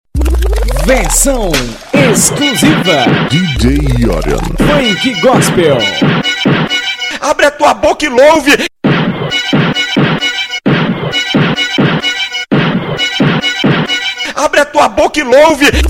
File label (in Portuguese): gospel mix funk